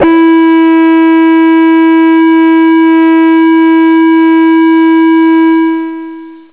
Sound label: hi e dark, guitar single notes